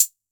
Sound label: Hihat Closed 606